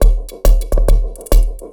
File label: percussion loops, Techno; PS-45